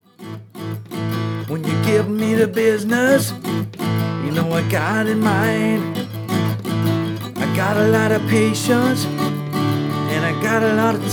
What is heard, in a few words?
same old blues